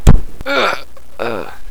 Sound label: fall; quake2